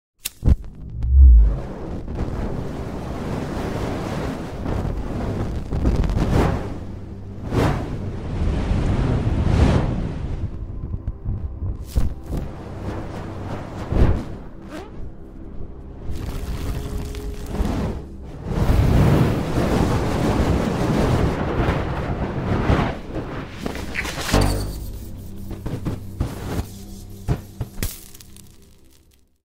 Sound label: Fire Burning